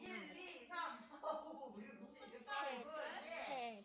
pad

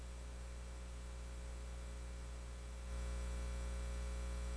woodcarving

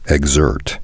exert

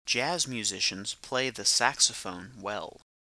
saxophone

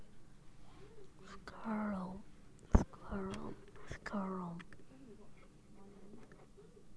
scream

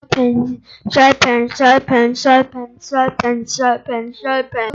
sharpen

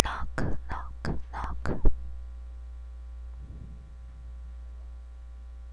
knock

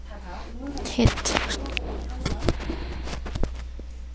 hit

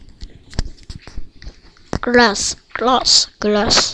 glass

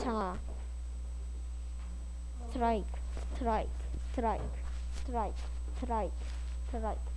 strike

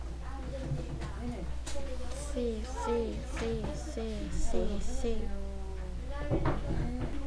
sea

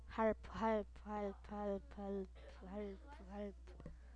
harp

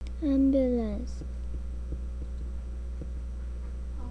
ambulance

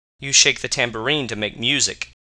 tambourine

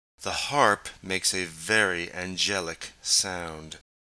harp

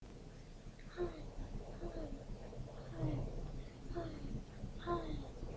harp

